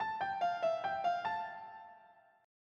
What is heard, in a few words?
C-Major Invention; Bach